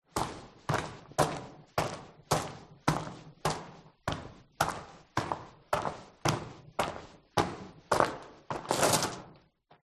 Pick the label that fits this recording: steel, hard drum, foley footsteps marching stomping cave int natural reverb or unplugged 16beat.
foley footsteps marching stomping cave int natural reverb